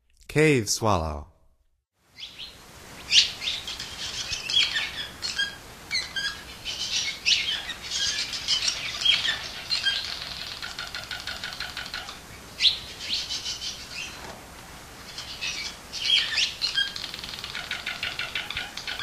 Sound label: Cave Swallow, Songs of Birds of North America; Stokes